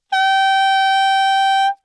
A6 alt, saxophone tenor

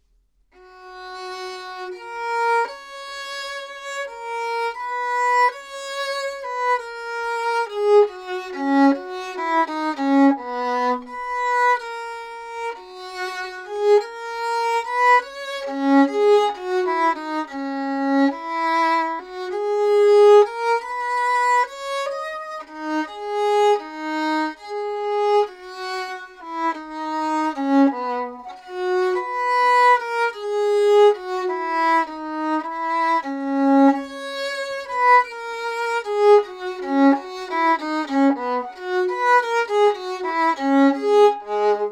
Modern Bow Gut 415; Bassano

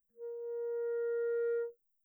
sneakin tongue barrel, clarinet articulation

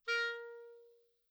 sfz, clarinet articulation

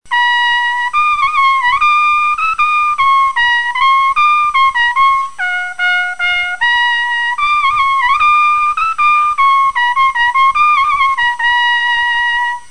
Danish trumpet; brass

